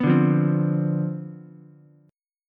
C Major 7th Suspended 2nd Suspended 4th C down Ukulele, chord; CM7sus2sus4 strummed; JGuitar